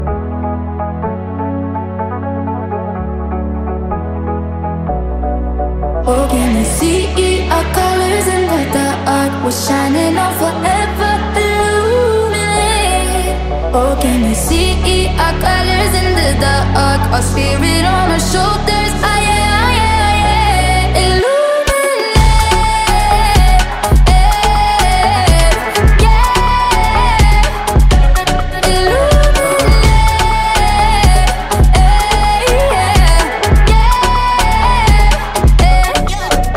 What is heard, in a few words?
music